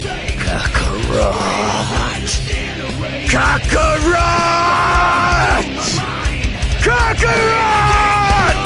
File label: Yelling; Broly